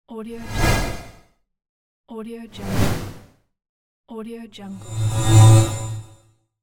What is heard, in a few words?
transition wooshes